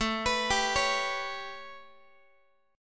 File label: A 9th A, Guitar; arpeggiated